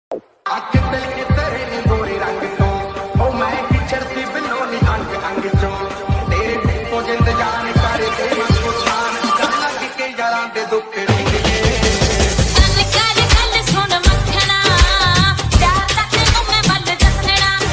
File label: bass boosted ringtone